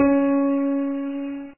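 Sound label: piano single noisy calm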